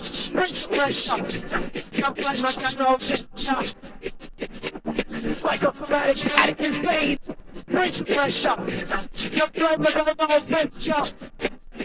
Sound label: voice